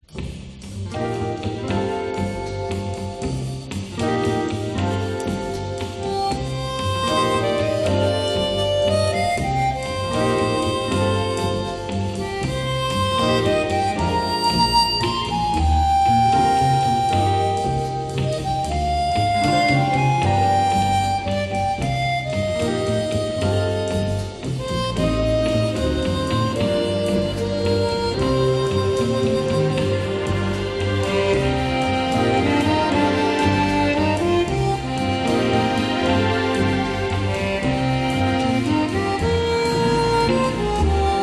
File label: Instrumental Version; The Cincinnati Kid